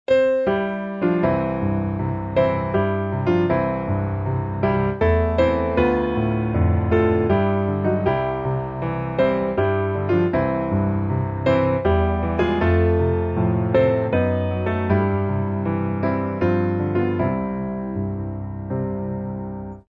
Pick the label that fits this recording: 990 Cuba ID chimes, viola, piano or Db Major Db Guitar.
piano